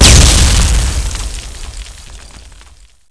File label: explode; Explosions